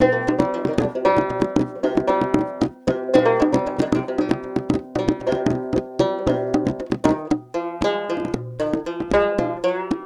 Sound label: banjo djembe; Koromanti 2